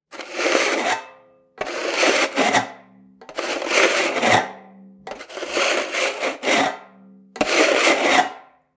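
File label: scraping sound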